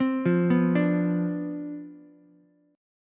C Major 7th Suspended 2nd Suspended 4th C down Ukulele, chord; CM7sus2sus4 arpeggiated; JGuitar